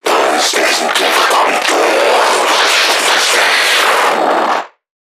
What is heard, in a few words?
Creatures Vocalisations Infected; Five Nights At Akers; soma; npc construct